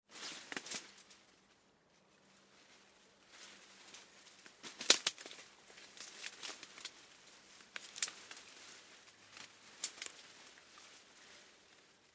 Leaves Moves Feet Snaps; Objects; BigRoomSound

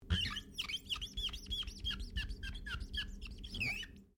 GLASS SQUEAK RUBBER SEQUENCE

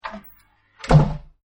Door Hydraulic Close; Doors General; Miscellaneous Doors

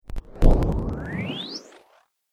Droid Static Charge, robot; Robot Sound Effects

